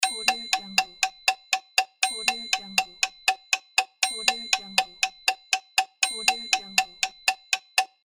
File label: game timer